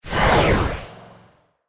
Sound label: trident rotate; Champions of Poseidon